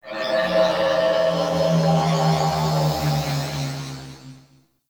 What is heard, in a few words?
Ship Exterior Space Drones; Warframe; Corpus Ship Remaster